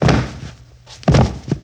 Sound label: Body Fall On Wood, Hand To Hand Combat; Sony Pictures Sound Effects Series